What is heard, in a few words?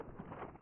lava, footstep